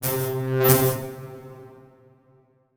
Transition Hit Impact Electrical; Adobe; Production Element

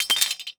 Weapon Sword Drop On Stone; AdobeCC

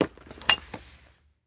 Weapon Holster Unsnap Knife Holster; AdobeCC

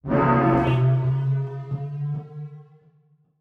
Title Transition Swell; Production Element; AdobeCC

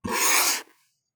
slice medium; CinemaSpice; Mograph Mini Transitions

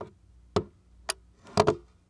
Pistol Set Down On Cluttered Table Slow, weapons; AdobeCC

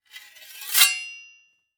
Weapon Sword Pick Up From Ground; AdobeCC

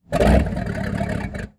Boat False Start Short; Transportation

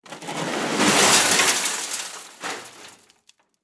Crash Glass Debris Sliding On Metal; Crashes

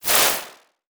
Animation Electronic

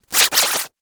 Multimedia Internet CD-Rom Flash Radio Element

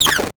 Title Transition Electrical Zap; Production Element Title Transition

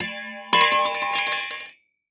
Impact Muffler Hit Cement

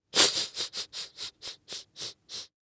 Human Male Hissing Laugh

